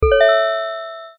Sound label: skill acquire; AuroraEngine